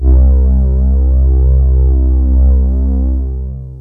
JX3P STRINGS, Roland JX-3P; TECHNO-BWARE

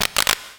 POP, Electro Perc; E-MU Formula 4000 Series Vol. 3; Analog Odyssey

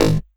PERC, Drum & Bass, 160 BPM; Ueberschall; Drum & Bass Flash Trackz

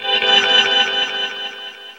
ECHO GTR, Funky GtrLicks; E-MU Formula 4000 Series Vol. 1; Hip Hop Nation

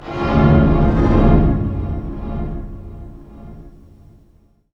STR HIT, String FX; Roland - LA Composer vol. 2

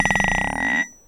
Small Anvil, contact mic, Metalics

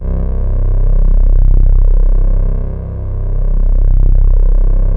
RESONANT C1; Strictly Synthe3; E-MU Producer Series Vol. 6 – Analogia Project

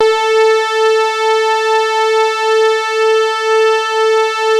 TARK SAW, Synth; InVision Interactive; Keith Emerson; The Most Dangerous Synth and Organ; SYNTH LAYER

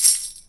KOMMERZDRUMS

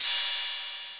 Korg KPR77, Drums

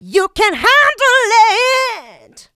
Female Vocals; Houseworx

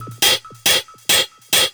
percussion loops, 138, Techno

